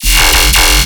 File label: Bass Hit F, Dubstep